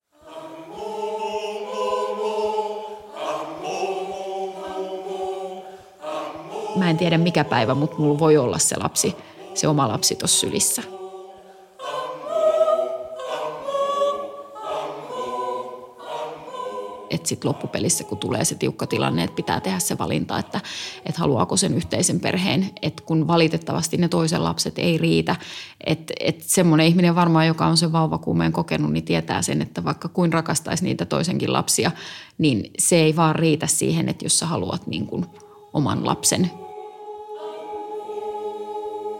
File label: Solo